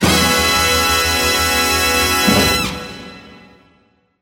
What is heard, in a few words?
Brass and Drum Tag in E